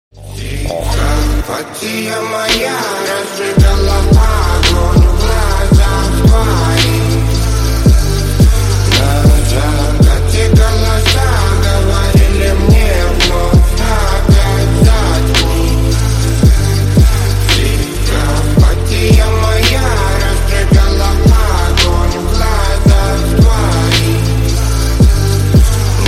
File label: bass boosted remix